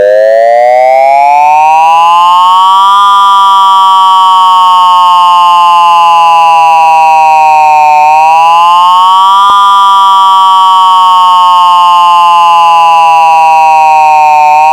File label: Short Wail